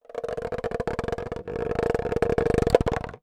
household small plastic empty water spray bottle drag concrete